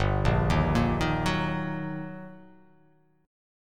G Minor Major 7th Sharp 5th, GmM7#5 chord; arpeggiated; 3,1,1,3,3,1,x,x; JGuitar

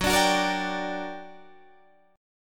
G Minor Major 7th, Gb, guitar chord

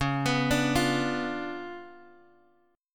C sharp 7th Flat 9th, chord; C#7b9 Chord {X 4 X 4 3 1} arpeggiated

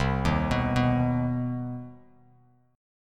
C Minor Major 7th C down Bass Standard; arpeggiated; JGuitar

